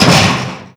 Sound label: bunker classic door open; gesource; doors